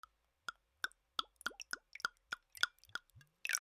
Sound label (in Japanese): ワイングラスに水を注ぐ; L40 ｜食・キッチン